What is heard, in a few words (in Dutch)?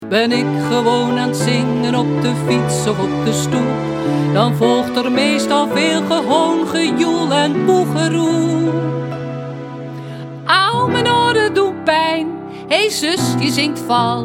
Zingende vrouw